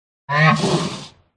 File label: rhino attack voice up